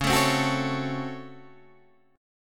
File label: Db Major 7th Suspended 4th Sharp 5th Db Guitar Standard; 9,12,10,11,10,9; DbM7sus4#5 strummed; JGuitar